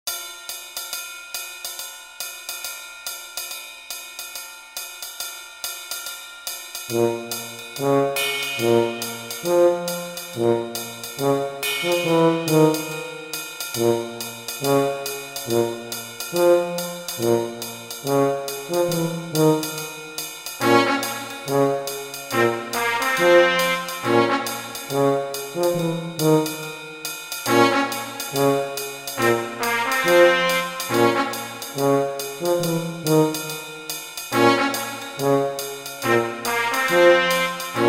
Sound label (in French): trompette